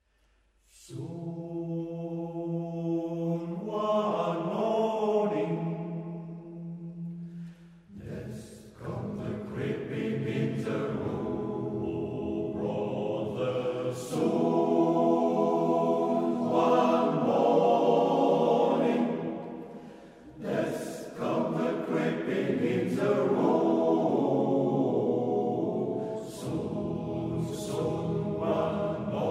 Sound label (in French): musique